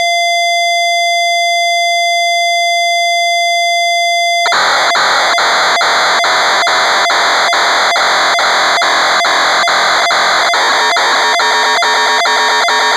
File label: DRUMACHINE